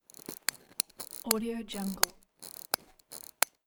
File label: stopwatch winding up spring